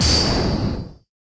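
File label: Hit long range fire bullet; The Denpa Men; Android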